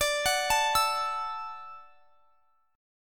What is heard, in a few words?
D-Major Add 9th D down; Dadd9; arpeggiated